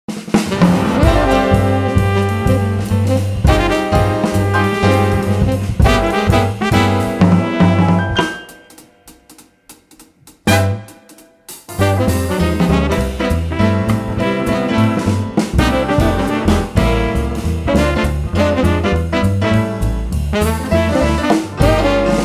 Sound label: Jingle Bells